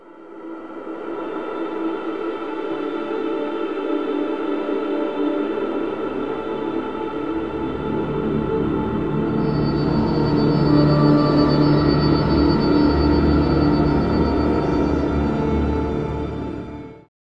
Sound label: Drone in&out; Babylon 5; Epsilon3